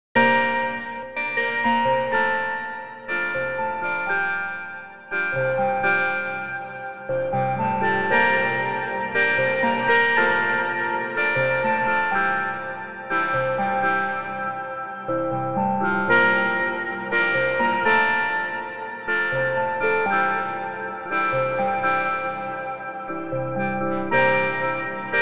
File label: Arturia SQ80 V Reverse; Ingenering